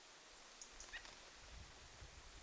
tuollainen punakylkirastaslinnun aani

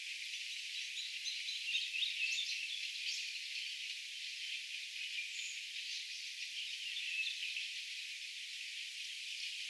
tuolla tavoin laulava punakylkirastaslintu

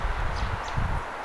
tuollainen pikkuvarpusen aani

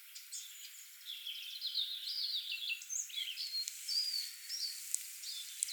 mahdollisesti jopa idanpikkusiepon laulu sen tyylinen; voisiko olla idänpikkusieppo?

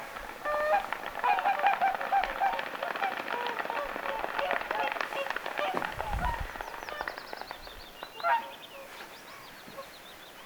laulujoutsenet taputtavat oikeasti ne nousevat ilmaan

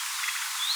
yksittainen sarja-aani mika laji

